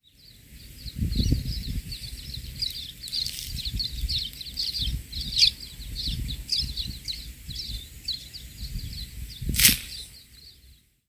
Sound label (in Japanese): トウネン 鳴き声